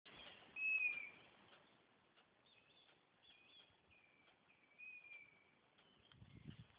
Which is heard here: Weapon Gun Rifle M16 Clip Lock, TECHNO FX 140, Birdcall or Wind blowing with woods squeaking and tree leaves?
Birdcall